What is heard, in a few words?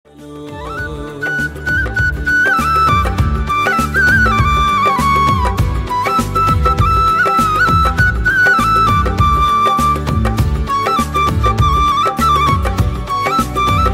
Gobind Bolo Hari Gopal Bolo Ringtone Flute